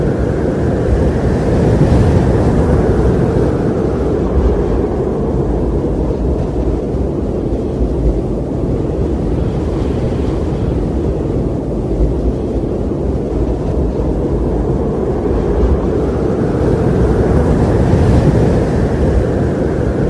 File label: night wind